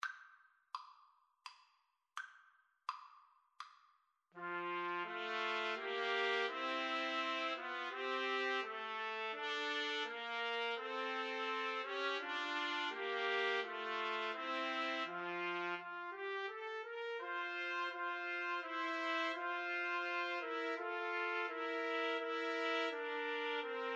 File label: my country tis of thee, Trumpet Trio